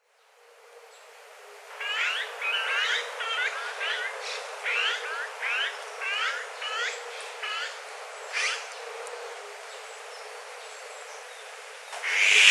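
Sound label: azure-winged magpie, kokubunji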